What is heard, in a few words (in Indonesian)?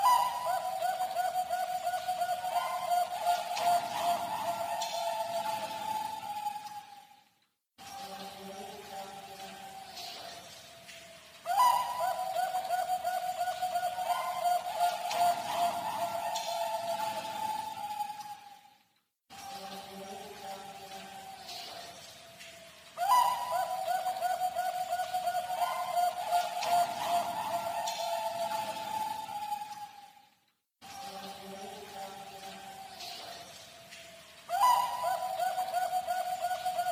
suara perkutut bangkok